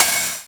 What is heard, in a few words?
Op Hat; K-100 R KIT